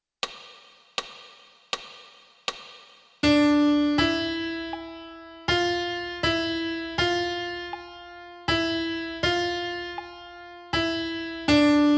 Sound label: Piano